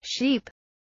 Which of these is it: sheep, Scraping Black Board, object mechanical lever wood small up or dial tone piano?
sheep